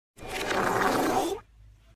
fart in reverse; meme

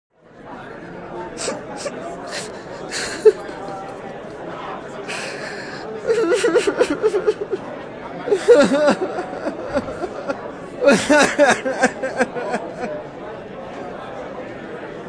crying

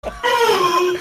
noissse

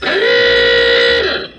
ahooga; roger rabbit

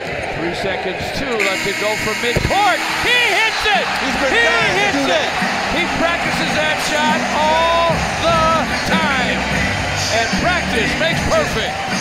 practice shots

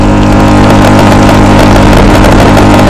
coffee machine

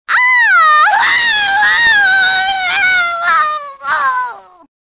female scream

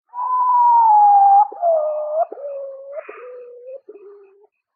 potoo a hoo hoo hoo hoo

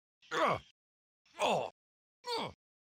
death sound; lego indy

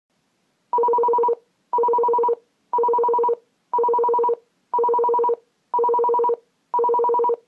alarm

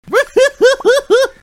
hah

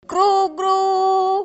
gruuu gruuuuu

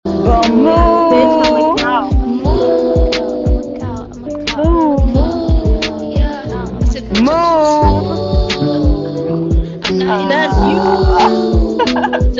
cow moo

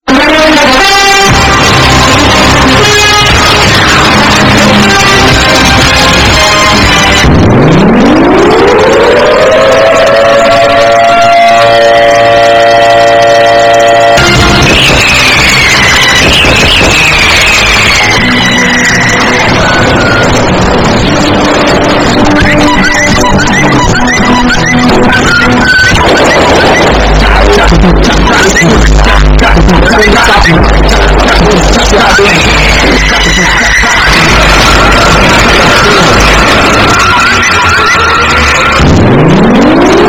ultimate earrape

